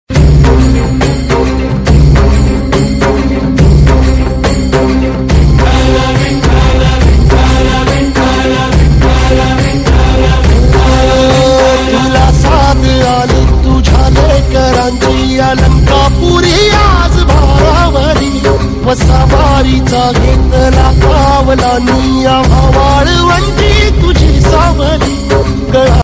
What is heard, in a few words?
marathi ringtone